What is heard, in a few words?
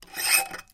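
sounds of scratches on metal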